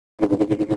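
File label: saber throw loop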